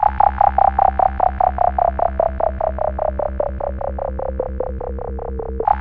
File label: BASS OCTAVES, BASS ARPS; Zero-G Ambient 1